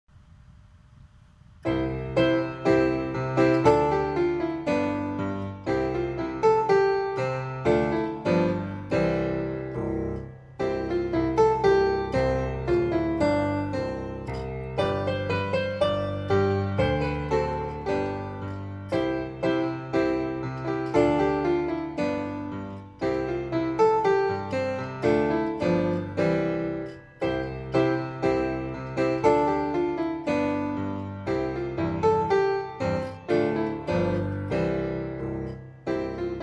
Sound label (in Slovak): klavír; Šípová ružička kvitne z jara